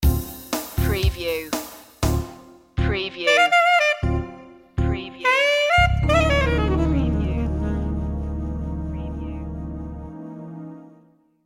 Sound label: sting